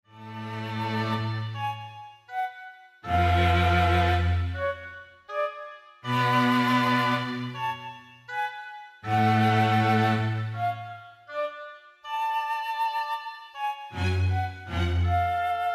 Symphony in Raga Kosalam

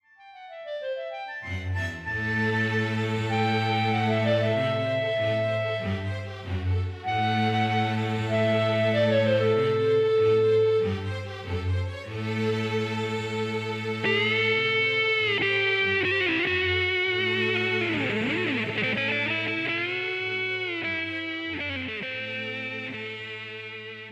Symphony in Raga Vagadheeswari

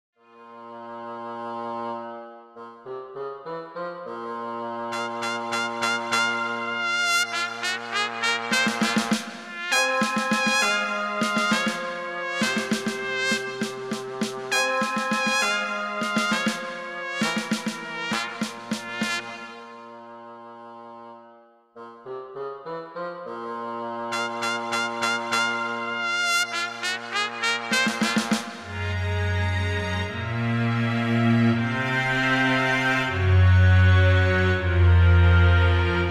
Symphony in Raga Shanmuhapriya

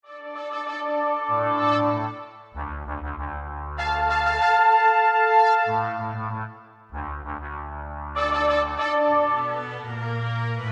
Symphony in Raga Sucharitha

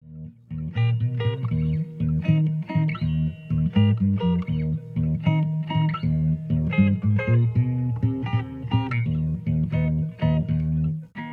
Symphony in Raga Mararanjani

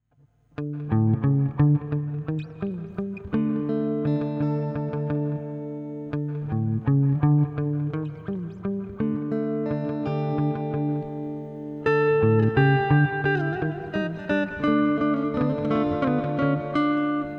Symphony in Raga Kokilapriya

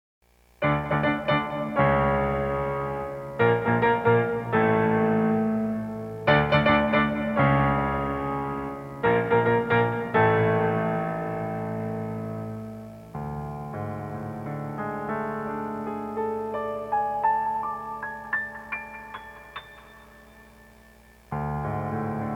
Raga Kamavardhini

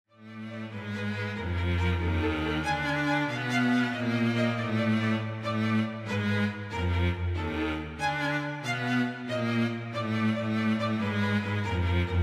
Symphony in Raga Gavambhodi

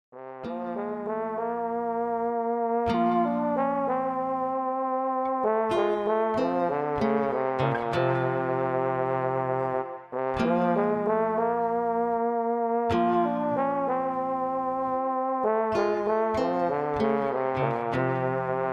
Symphony in Raga yagapriya

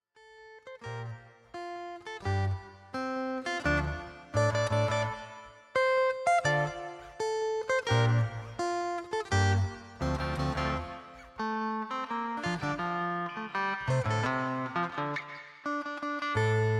Symphony in Raga Ragavardhani

